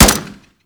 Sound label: Fire Mech, Weapons; Warframe; Burston